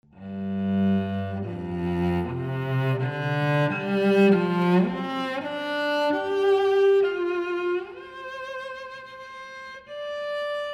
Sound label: Cello, Small Hall; Bricasti